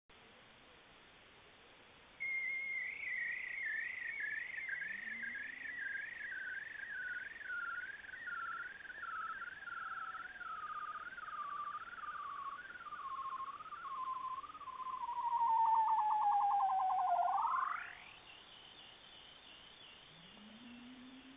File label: bearded seal; arctic; mammals